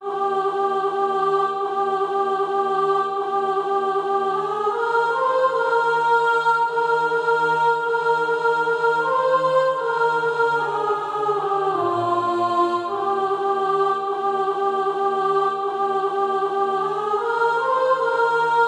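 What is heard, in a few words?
soprano; A Sinner Forgiven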